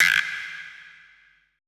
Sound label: GUIRO, PERC; BPC PERC